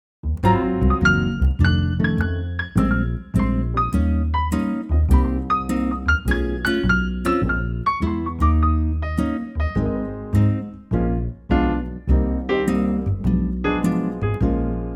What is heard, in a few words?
Ab Trio